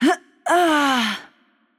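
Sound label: Death, Czech; bloom minigame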